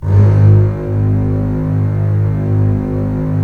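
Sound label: Strings contrabasses; Roland - LA Composer vol. 4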